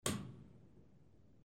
Switch On

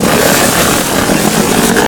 Crit

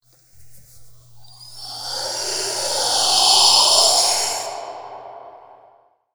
hissing whoosh

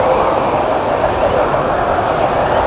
archmage twister

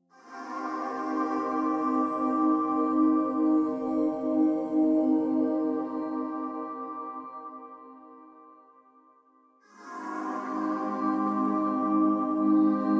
Tension Pad

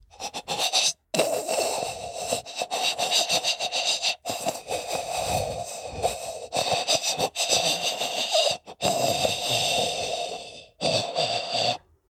Grave Zombie idle loop

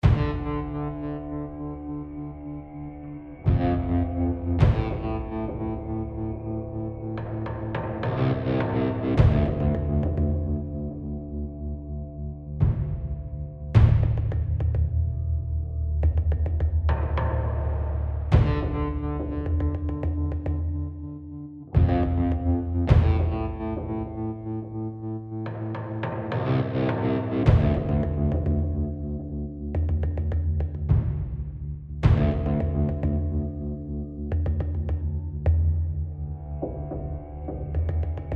Tension Sudden Death

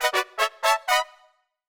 Big Item; nusakana

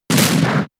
Double Kick 1 hit; pokemon sfx gen 7 attack moves sumo usum

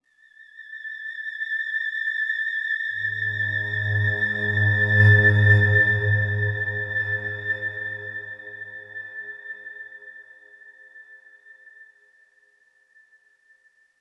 Oneshot Synth; Zombie Army 4 Dead War

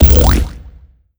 Pig Hit

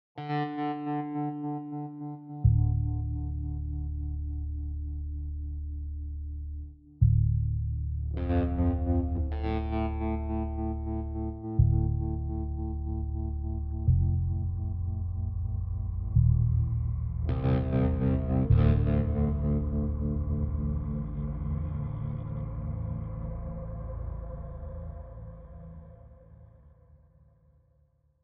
Tension Low; The Last of Us; AB Tension 4 Low Block

